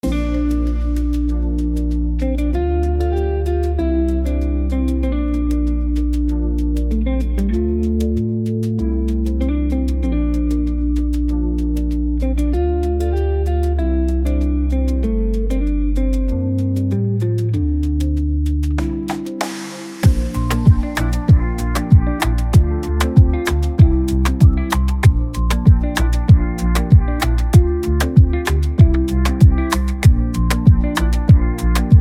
BEAT

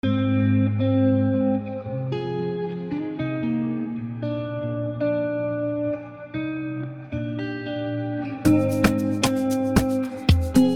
BEAT

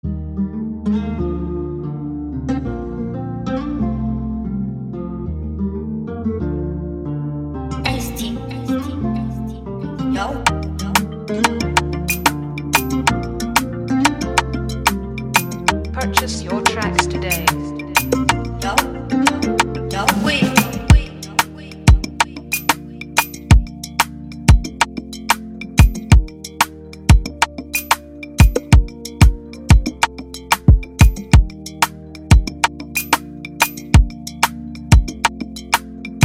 FREEBEAT

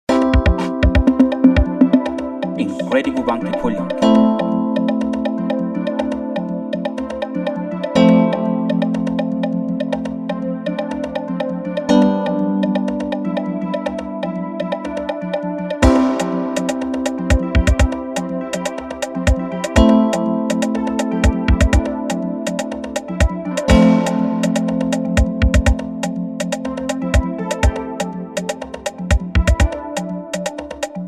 Freebeat afro pop sample

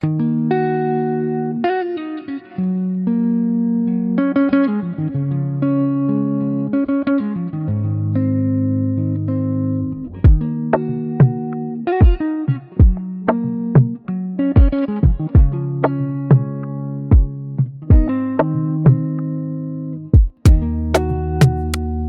BEAT

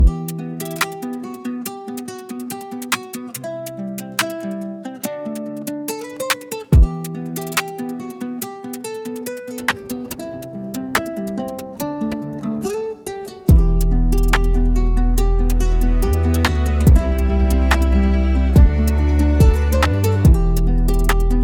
drill beat

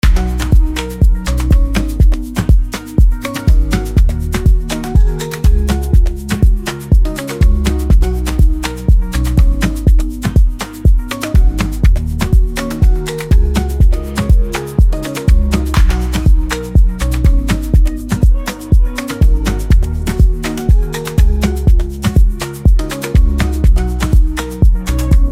BEAT

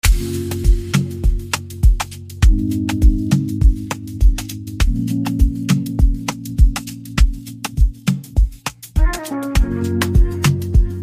BEAT

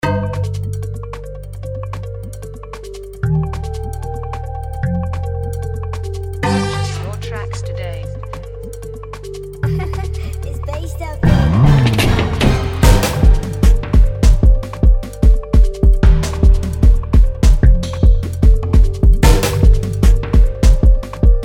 150BPM

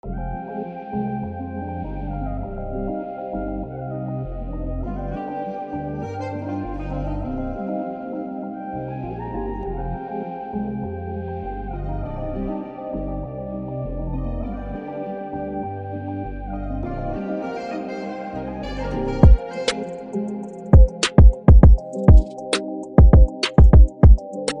BEAT

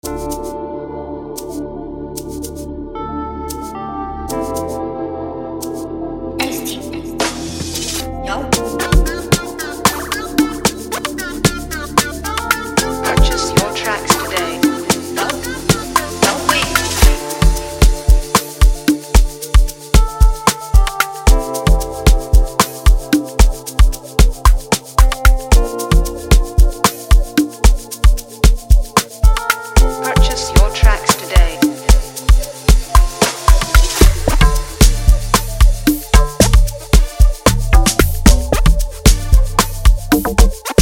FREEBEAT

